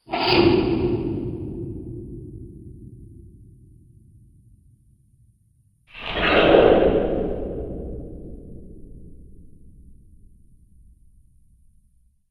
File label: Two Menacing Monster Sounds; Monster Sound Effects